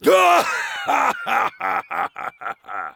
laughter big; Rire gras; Heavy; Réponses du Heavy

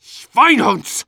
Medic negative vocalization

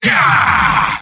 cheers

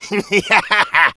Demoman laugh evil, fr

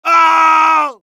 pain severe, es; Heavy

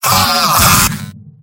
Engineer pain severe

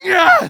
Medic taunt exert

